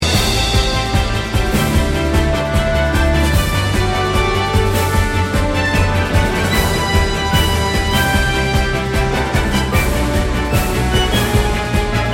Gacha Loop; mist train girls android ios mobile gamerip 2020

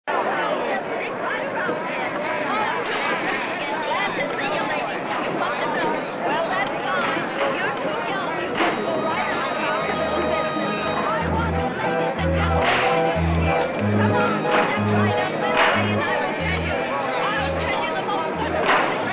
Music & Ambience; marvel ultimate alliance 2 wii gamerip 2009

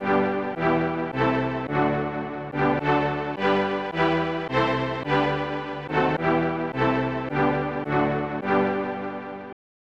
National Anthem of Switzerland; Elite Soccer; SNES

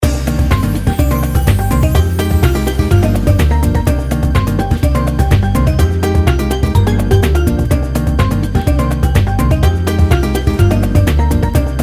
Raid; Mist Train Girls

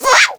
bug; Birthday Party Bash; Wii; Spanish; bug catcher

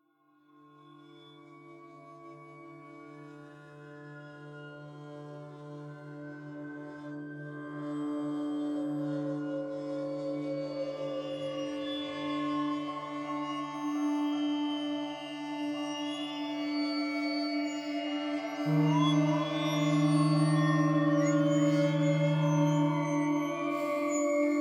Savannah Music

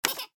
Click

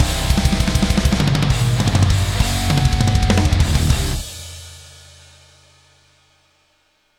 Death Metal Outro; Metal Tales Fury of the Guitar Gods

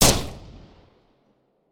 Rifle Shot; blastmorph pinball; xbox series xs

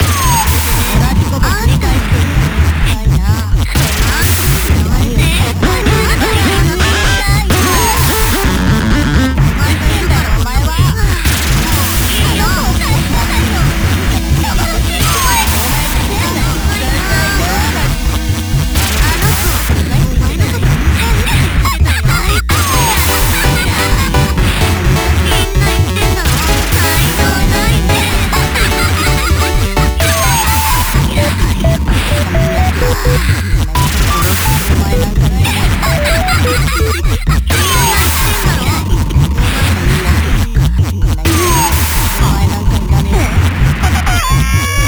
8bit Trueboss; Radirgy 2

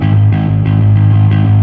Fuzz G sharp 1

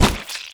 Brawl hit; Blastmorph Pinball; xbox series xs

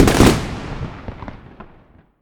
Cluster explosions; Blastmorph Pinball; xbox series xs

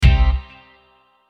Sting; Out For Blood

